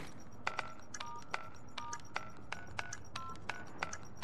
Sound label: bomb defuse